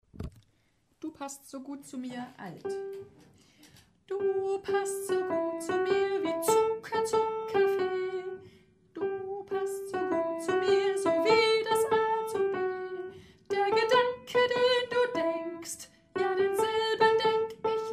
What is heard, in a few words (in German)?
Alt, Chor; chor ag